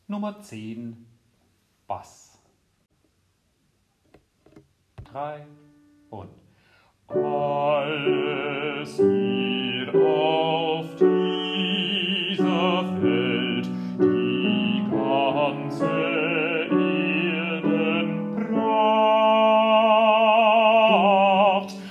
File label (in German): Bass; Dioezesan Caecilien Verband; DCV Chorfahrt; Übaufnahmen